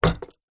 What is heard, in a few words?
household table lamp metal antique down on table